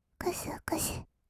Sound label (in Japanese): くすくす, kawaii voice; 1200 kawaii voice!!; 色々なワンショット